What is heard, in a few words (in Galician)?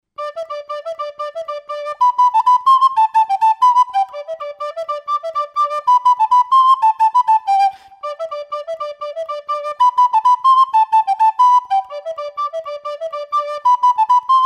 trad, Pito Galego